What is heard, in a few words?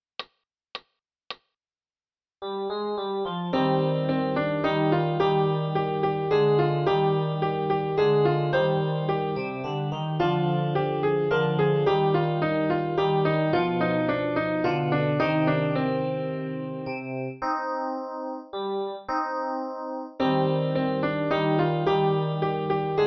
Piano; A La Nanita Nana